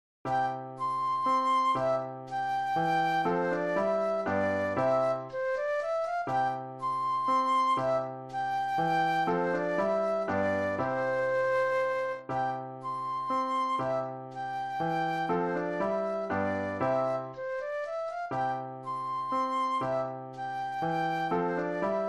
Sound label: Flute Solo; classical